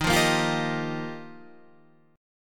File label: F 7th Suspended 2nd Suspended 4th